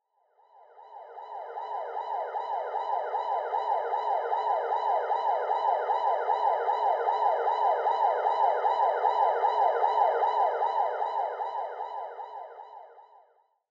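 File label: siren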